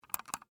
Mouse Click Wireless, Technology, Mouse, Click, Wireless, Laptop, Mouse; Computers; BlastWave